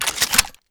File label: r870 worldreload; tf2classic